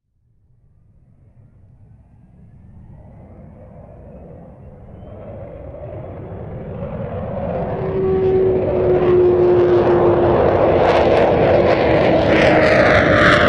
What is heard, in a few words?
avro vulcan bomber; sonniss gdc